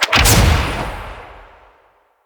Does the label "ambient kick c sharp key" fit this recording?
No